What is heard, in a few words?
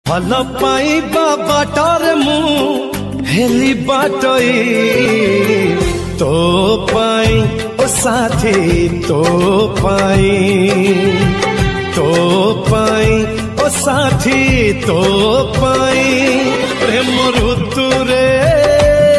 Odia Song Ringtone